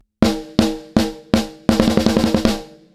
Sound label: snare RATIO 12; Lindell 7X-500